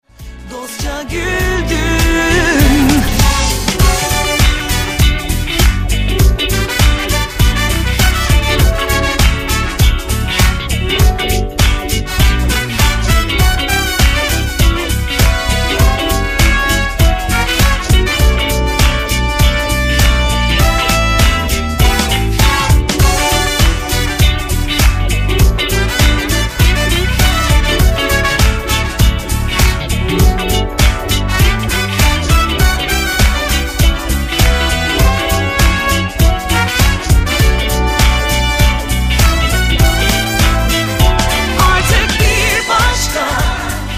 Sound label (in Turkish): brass melodi